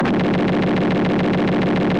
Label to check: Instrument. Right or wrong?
Right